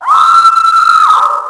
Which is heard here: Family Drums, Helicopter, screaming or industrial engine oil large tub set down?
screaming